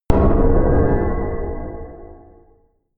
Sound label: sub wobble distort; hits impacts